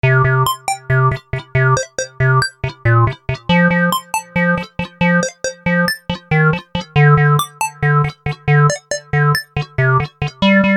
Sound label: Upward Blip